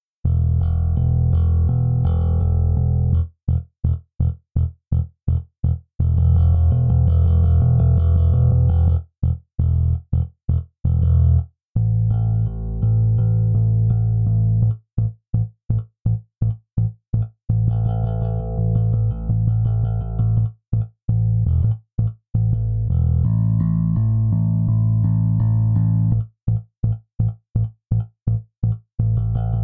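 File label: Bass, default setting, finger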